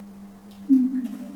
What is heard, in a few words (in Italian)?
Mugugno; Montagnana